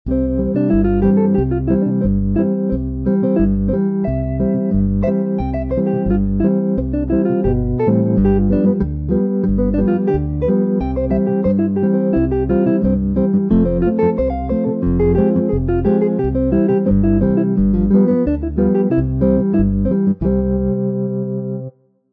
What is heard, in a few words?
Electric jazz guitar